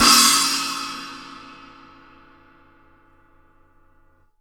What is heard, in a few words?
China 14 FF, Drums; Big Fish Audio; Ross Garfield Drums VOL-2; TOPEKA KIT